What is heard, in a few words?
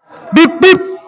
beep beep